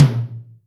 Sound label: Room Mid, Acoustic Tom; Yamaha DTXpress